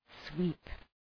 sweep

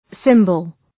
cymbal

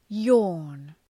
yawn

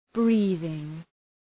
breathing

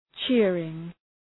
cheering

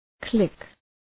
click

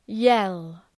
yell

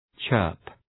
chirp

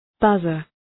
buzzer

